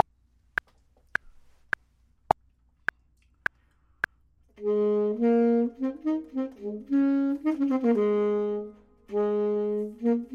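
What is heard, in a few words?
bottom, Saxophone and Clarinet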